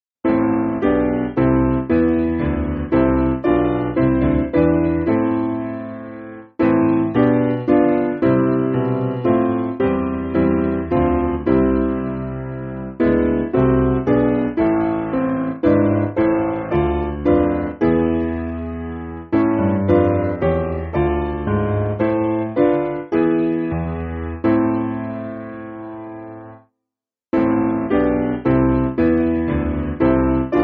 Piano, Music Backing Files for Churches

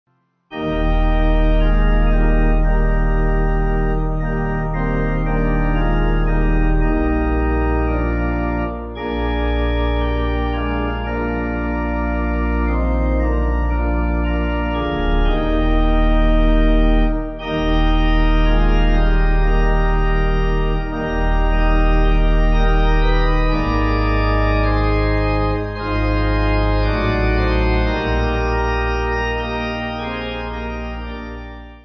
I Would Be True, Pipe; Organ; Peek

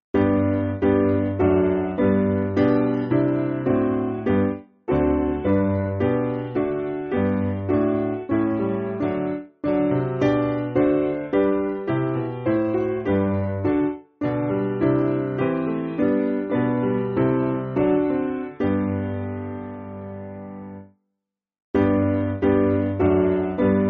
Piano; Pour Out Thy Spirit; Ely

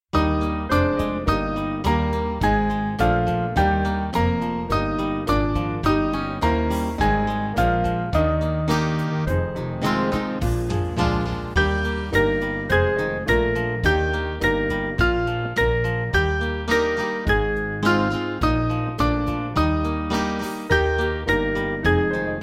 Band; Saviour Breathe; Vesper Hymn; Eb

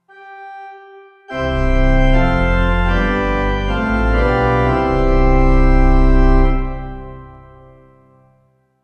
Hallelujah Pipe; Morning Service

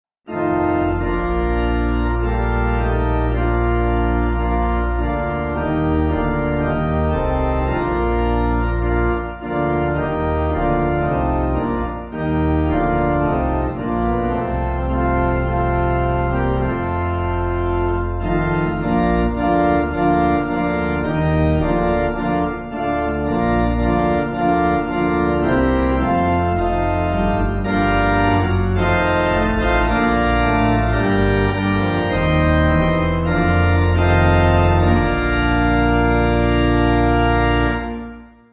When Mothers Of Salem, Pipe

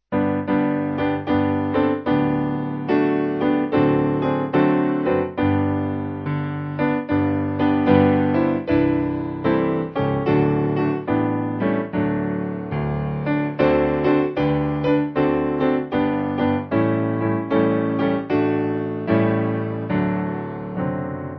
In Tenderness He Sought Me, Piano; Gordon

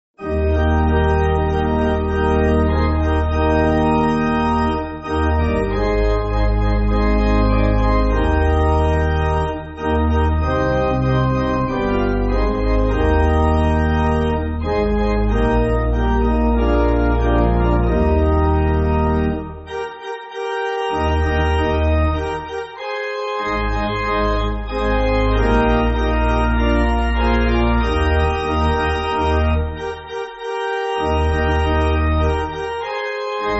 Pipe Organ, Backing Files for Churches; Sankey

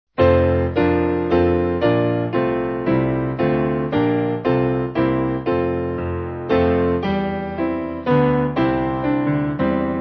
Piano

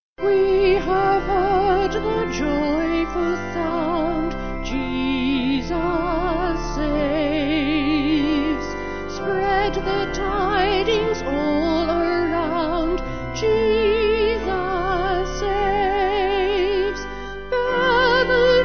Vocal Organ

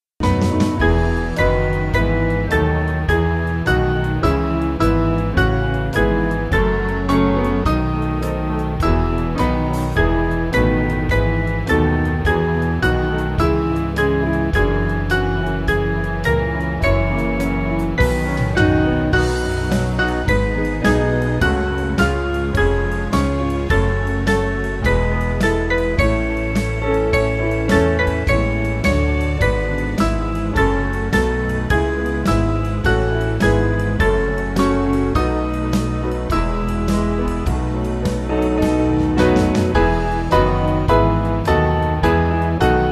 To Thee O Lord, Golden Sheaves, Band; Small Band; 4/E-F

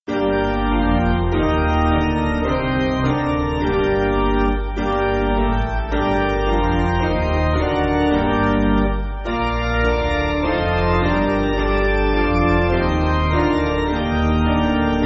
My Heart Looks In Chinese OPiano

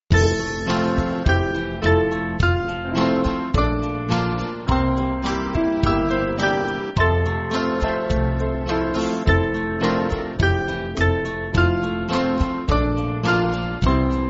More Love To Thee, band

